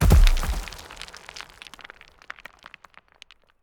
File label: Music Minigame Statue Fall, Player Instrument